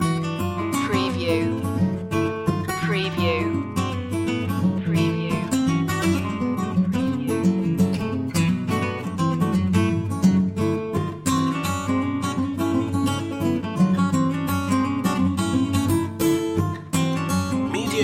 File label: bar room rag loop